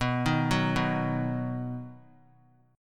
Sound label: B Major, 3C,3F,3A,3D; arpeggiated; JGuitar